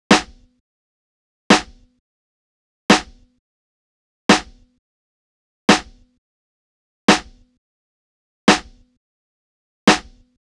Drum Loop - 86 BPM Snare, Boom Bap; Bristol; Cymatics - ANALOG EVOLUTION Beta

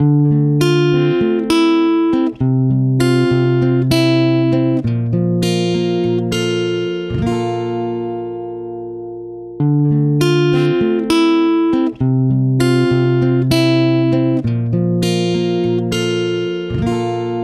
Guitar 100 BPM (Fmin); Jaded; Ghosthack; Guitar Loops & Shots

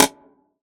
Synthesized Perc; Drums; One Shots; Ghosthack; Drum Mega Bundle; Drum Hero 5; Athos

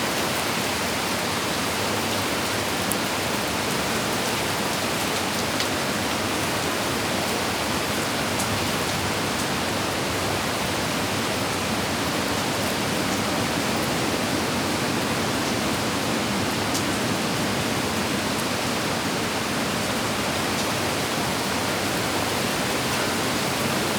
Strong Rain; FX; Cymatics; Eternity Sample Pack

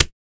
Short foley

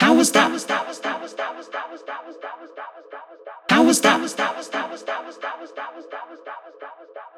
Vocal Loop 130, Techno; Loops; Vocals; GhostHack; Ghosthack - Free Techno Sounds 2024

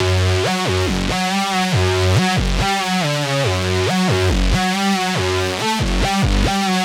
Riddim Melodic Loop - F# 140; Re-Space; Ghosthack; Riddim Essentials Volume 2

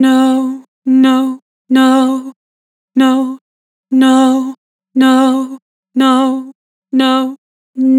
Vibrato "No", Harmor Vocals

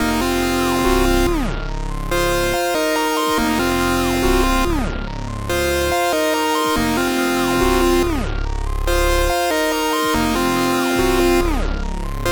142 BPM F Min, Melody; Cymatics; 2022 Melody Collection; Wavy

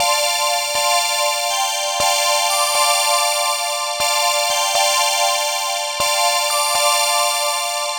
Loop Atmosphere 120 D 7th, Deep House; Loops; Ghosthack; Advent Calendar 2019

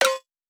Wonk One Shot - C; Cymatics; PHARAOH Beta Pack; Pharaoh Premium Drum Samples (BETA)

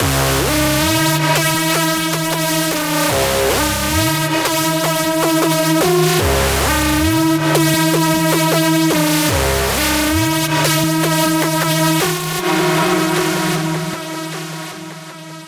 lead loop Dmin 155; Music Loops; GhostHack

